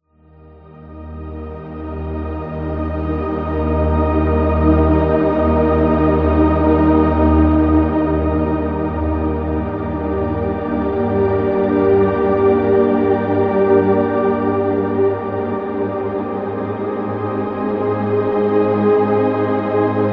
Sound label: The Meditate, meditative